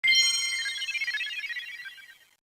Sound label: find